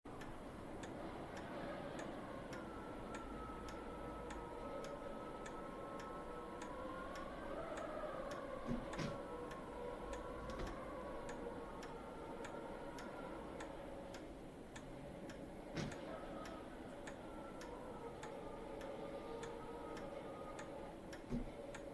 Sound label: Lonely Manor Clock Room